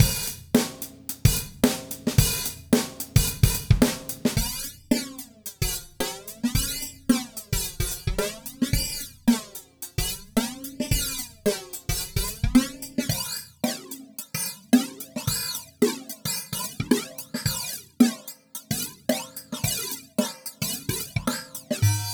Drum Loop; Dipole

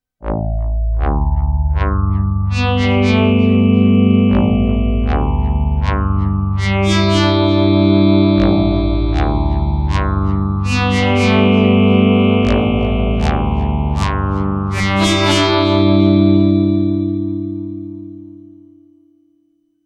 KORG Node Fourier

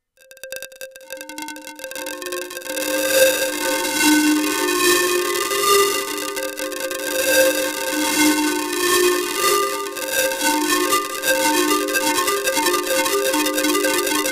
Sand Storm; KORG Tube

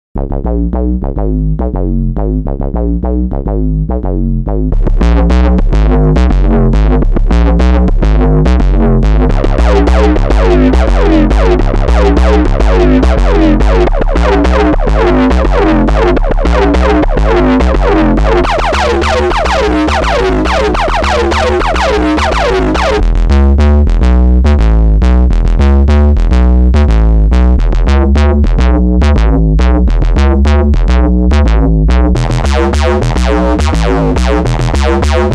Korg, 10 Distortion Types; Corrosion

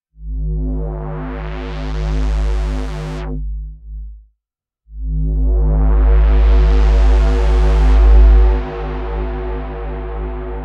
Unison Square; KORG

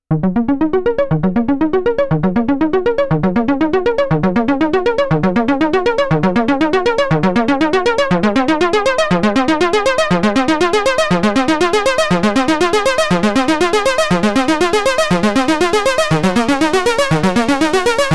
Toxic Pluck; korg bent

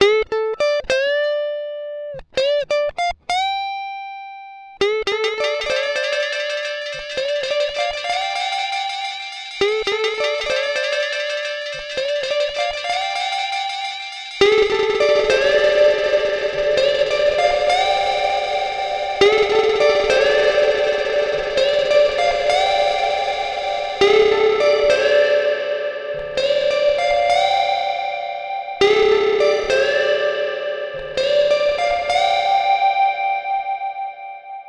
Electric Guitar; Droplet

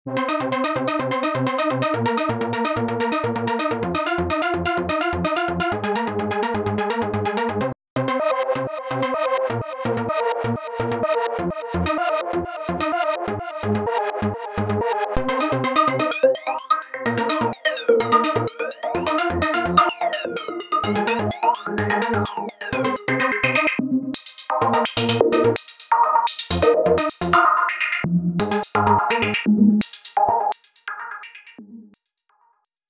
Trance Arpeggio; Multitude

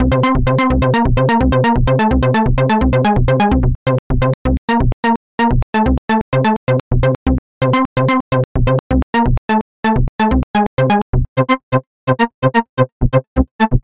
Arpeggiated Synth; Inertia

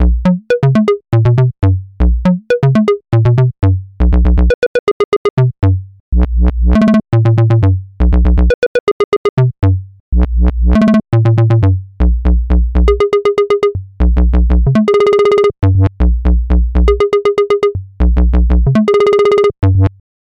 Analog Bassline

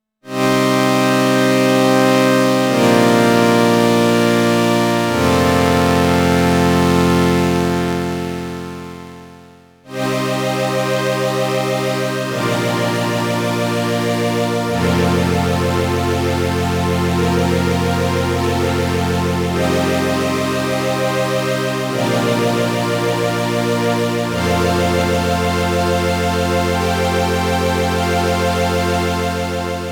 Synth Strings; Eternal

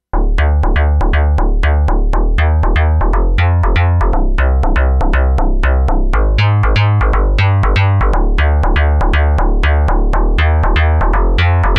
KORG Node E-Bass

